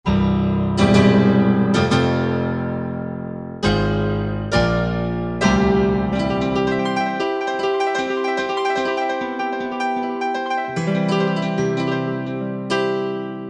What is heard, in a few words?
Piano 16', Korg M1; Factory preset examples